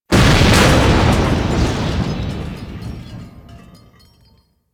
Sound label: Destruction Explosions One; Ambience; Warframe; Corpus Railjack